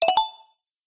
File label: get coin; CrossyRoad